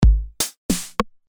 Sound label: drums